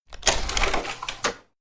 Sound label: slide